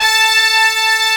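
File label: BRASS A4 left, BRASS; BRASS#1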